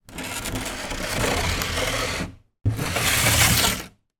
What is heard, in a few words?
household watering can plastic empty drag on hard floor x2